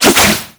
Briefcase Scythe Spin Whoosh; Warframe; Corpus

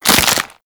Stealth Neck Snap; Warframe; Characters; Tenno

